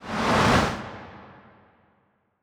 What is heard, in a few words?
kaiju hatchling tail swish high; biogenesis